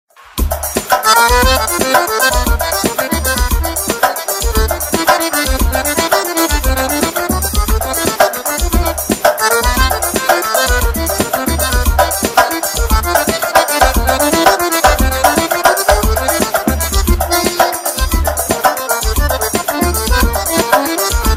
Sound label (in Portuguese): tocando forro